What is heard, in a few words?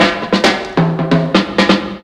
drumbreak, 130